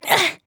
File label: Grunt Hit; Rider